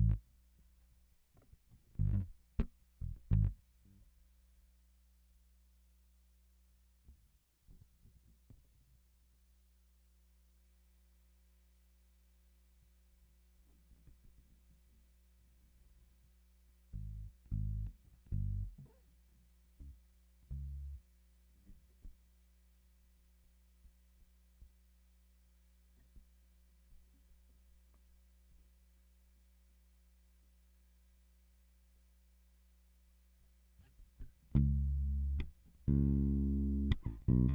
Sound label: Bass